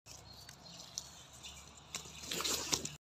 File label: Picking fruit from tree; چیدن میوه از درخت